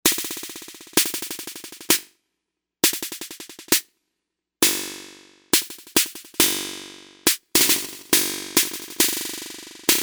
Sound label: Random Rep Snare, Novation UltraNova; Factory Patches